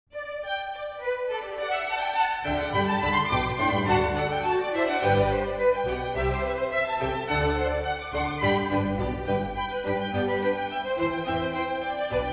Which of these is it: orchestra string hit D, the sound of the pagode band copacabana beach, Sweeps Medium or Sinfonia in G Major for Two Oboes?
Sinfonia in G Major for Two Oboes